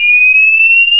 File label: medium sweep, fire alarm; garrysmod